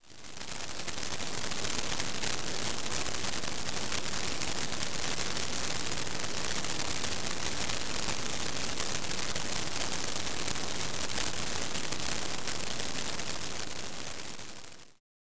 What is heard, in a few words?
torrential downpour